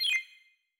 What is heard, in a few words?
confirm, tones; inteface sfx pack 1